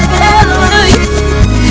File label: Hallelujah